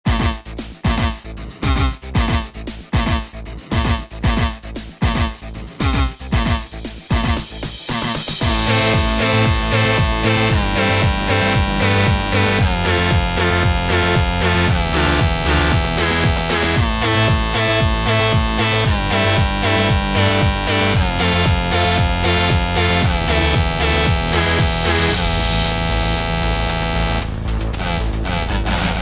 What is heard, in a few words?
Disco Descent with kazoos; banjo kazooie kazoos; crypt of the necrokazoo